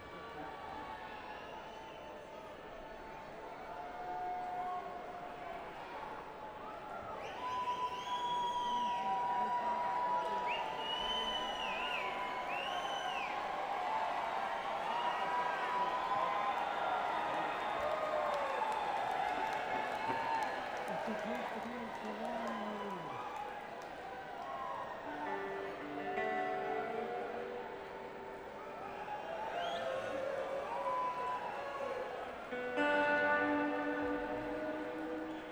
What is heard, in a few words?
Crowd & Tuning; Bluegrass; schoeps